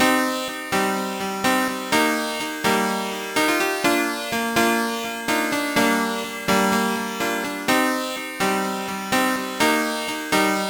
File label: piano song; Trackers; Modland